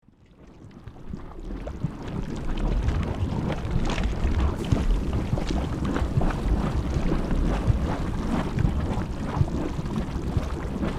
Lava lake bubbling magma loop; volcano

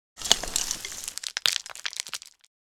Falling dirt debris dust dropping on wood

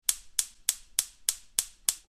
Gas stove turn on gas clicks ignition; cooking

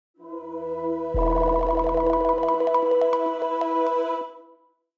Positive uplifting logo intro

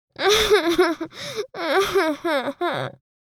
Little girl crying

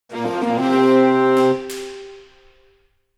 Mobile game nordic vikings victory level complete

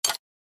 Battle melee accent hit

